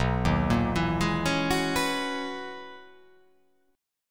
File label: B Major 13th B down